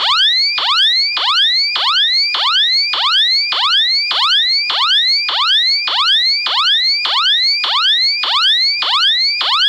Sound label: Missile jettison